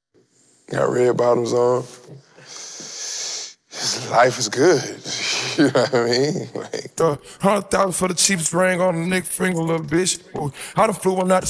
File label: vocals